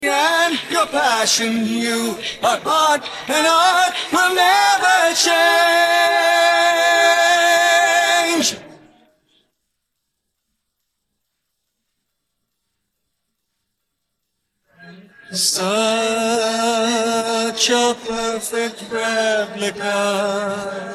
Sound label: vocals